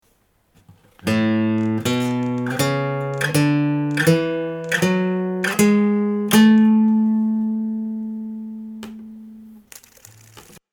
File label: A major scale on A string